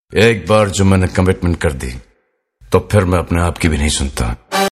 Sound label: Warning Sms